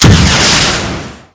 rpg, rockets; gdc

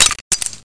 fiveseven clip out; weapons; fokku tc usp

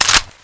mp9 clip in; weapons

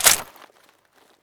aug clip in; fnscarh; weapons

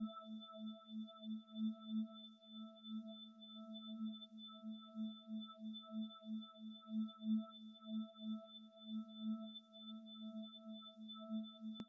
gem loop

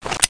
unfold; satan1

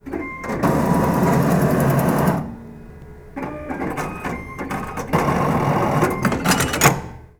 spit out, atm

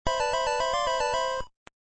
game over, tetris

